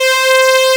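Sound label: analog strings C4, analog synth; AKAI S6000 CD-ROM - Volume 1; SAMPLE WAVE MIXING 1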